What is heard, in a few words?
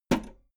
video tape recorder flap hit